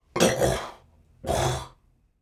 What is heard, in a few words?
Man Clearing Throat